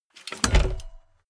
bmx bike bar spin heavy

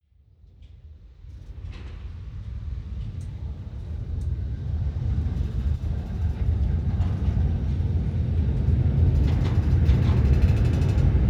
train locomotive start rear

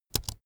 keyboard laptop space press fast soft

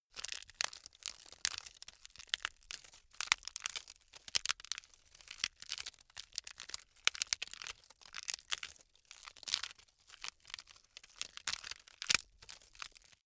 bamboo crack sequence

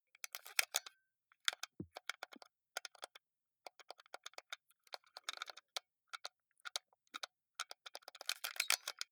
console controller xbox play sequence lively

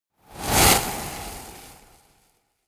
sweep

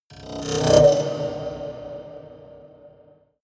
haunted strings roll high

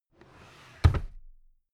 drawer cabinet carton close heavy

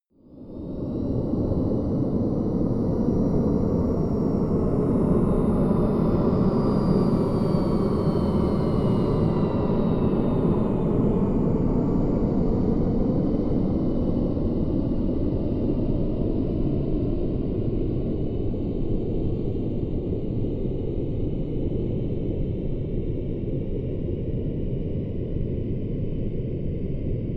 plane honda private small flyby distant

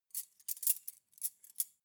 cutlery cake forks handle

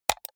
computer mouse right click hard

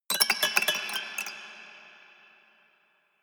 haunted strings tinkle sequence fast short hard

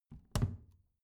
plastic trash can close regular muted

